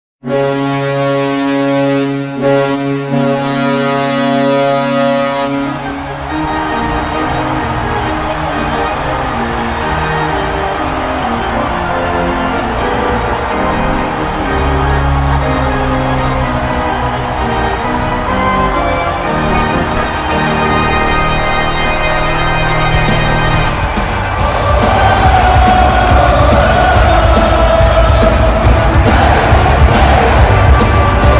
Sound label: Goal Horn; St. Louis Blues 2025